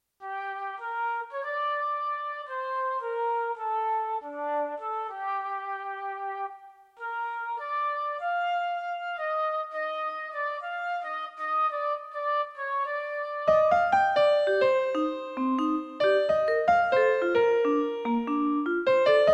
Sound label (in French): Jazz vals